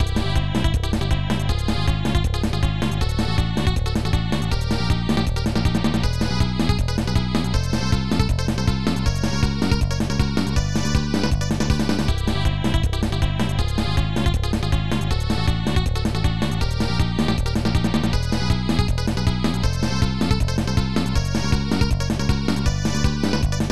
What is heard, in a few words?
Warning; Super Puyo Puyo 2; SNES